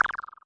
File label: Cyborg Kit